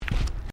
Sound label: Footsteps Decking Wooden Step; Crocs; Footstep Foley; Human